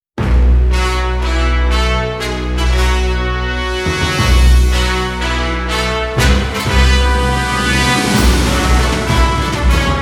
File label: Fanfare - Honolulu Racecourse